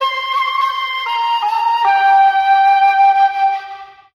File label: flute native call, Loops, Jazzmatazz